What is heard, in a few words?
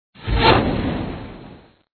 MISIL; ufomania